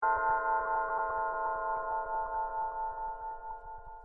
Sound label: bells test